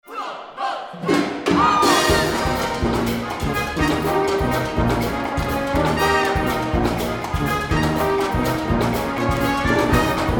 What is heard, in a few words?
Salsa, Brass Band